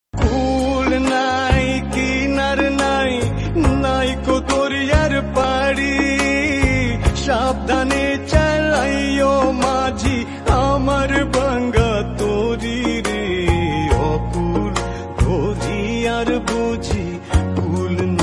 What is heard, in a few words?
sad music instrumental bengali